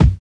Hiphop kick

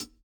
hihat, house

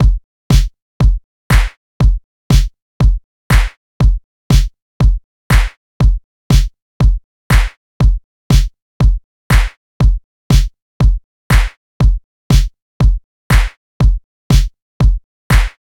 loop 120; Pop; Reference Beat BPM-130; Pophits

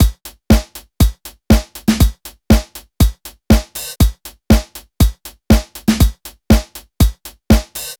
Electro, electro pop; Reference Beat

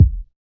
Hiphop kick; Reverb+EQ+Comp+Limit

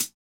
hihat, house

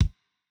kick; Reference Beat BPM120; j city pop 1980

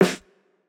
Jrock snare

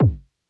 kick, house

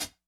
hihat; Pop; funkvol1

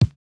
kick, house

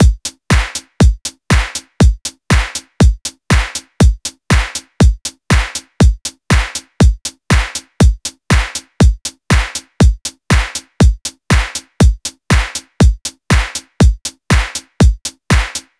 Electro loop; Reference Beat

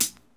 Rock hihat; Reference Beat

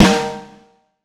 Rock snare; Reference Beat

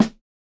snare, snare house

